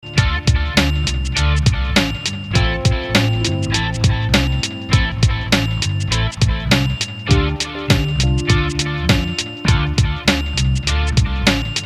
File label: Instrumental